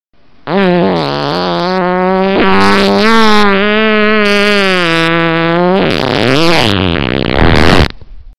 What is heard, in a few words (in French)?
Bruitage Péter